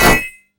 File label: spear thrust; Character Actions